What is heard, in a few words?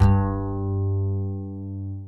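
acoustic bass slap G2, left; Masterbits CLIMAX 7 - Bass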